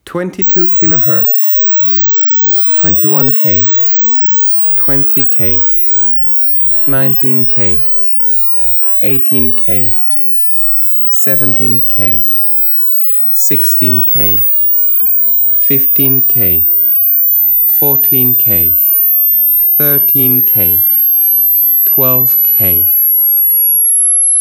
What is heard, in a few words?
Frequency check 12-22 kHz